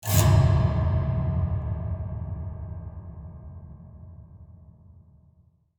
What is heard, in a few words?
player detected screechy; dishonored